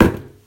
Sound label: Trash Stomp, Foley; TRUTH-HZ; Crowdsourced Sample Pack